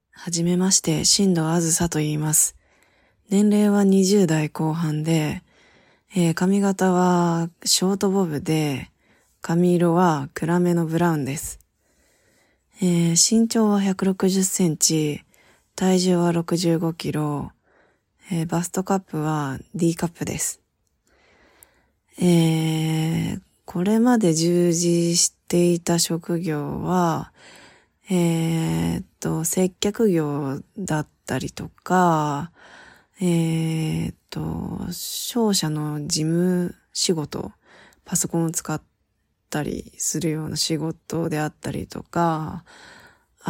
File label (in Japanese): オナニー実演 低音ボイスでおまんこをぐちゅぐちゅかき混ぜながら2回絶頂